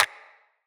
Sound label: claw clack; Enemy; Combat; Characters; Corrupted Sproutfolk